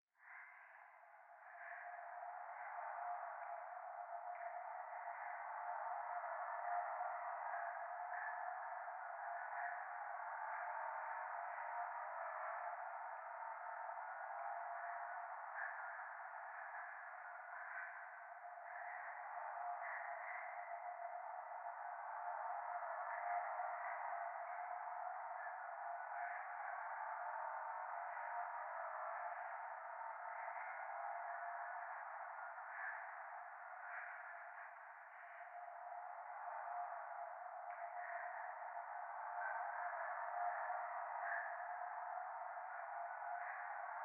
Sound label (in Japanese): 廃墟 右耳快感吐息 車の中